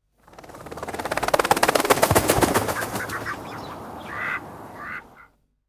close-up rapid bird wing flaps; wings

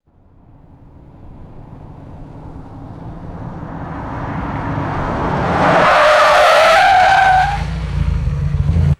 car arriving fast with tire screech

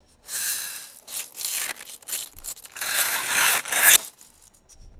repeated digging in dry sand; dirt

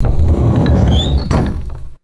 sliding closet door rolling open with squeak; doors

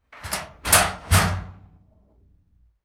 metal locker door opening; doors

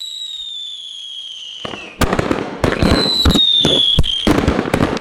firework whistle and rapid popping explosions

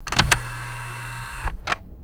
computer floppy disk ejecting; ui

